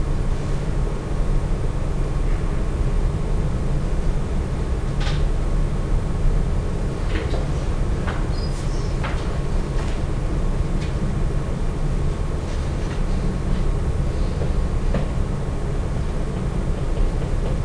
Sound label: ATMO; TKKG 2